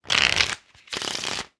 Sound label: cards shuffle; keezers